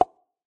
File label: pop; CrossyRoad